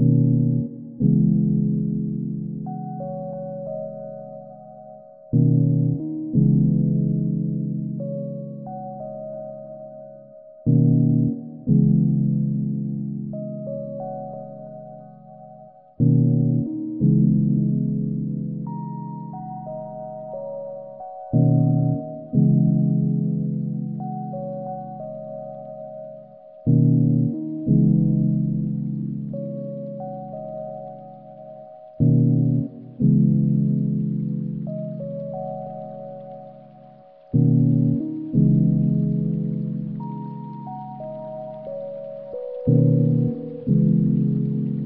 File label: underwater